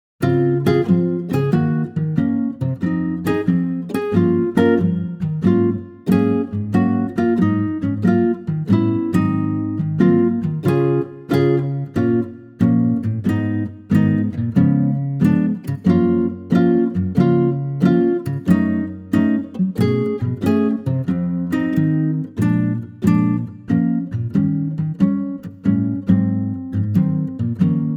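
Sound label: acoustic Guitar Db; key - Db - vocal range - Bb to Db; Superb acoustic guitar arrangement of this wonderful classic standard.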